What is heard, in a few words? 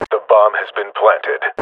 bomb planted; announcer; cs2 radio m